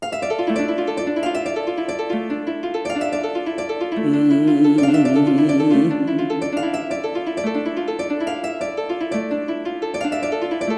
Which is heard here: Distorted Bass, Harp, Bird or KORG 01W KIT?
Harp